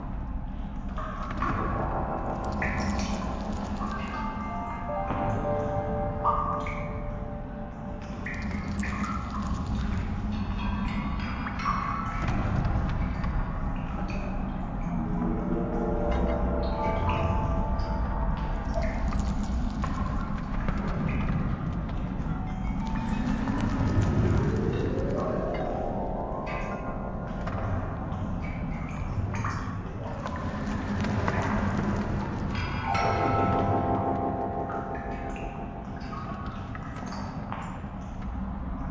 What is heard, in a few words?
cave ambient